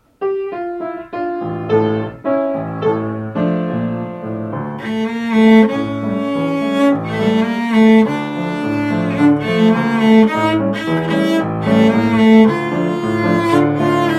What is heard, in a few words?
orch cello; Music Concert 2019